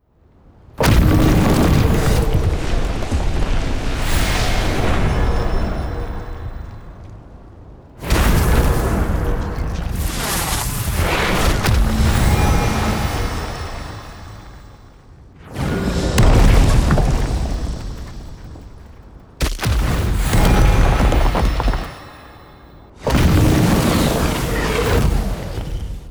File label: Magic Spell Earth